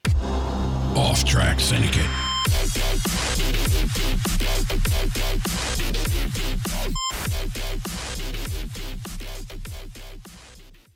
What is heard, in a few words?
stinger